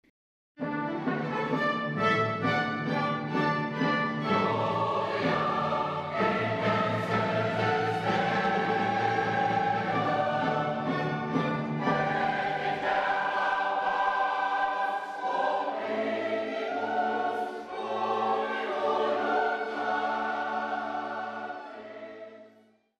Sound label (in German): Gloria G Dur; Caecilia Gruiten; Schubert